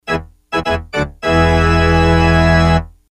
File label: fanfare